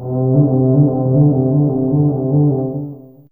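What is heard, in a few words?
Violin Tremolo C3, Fairlight CMI IIe; TremStr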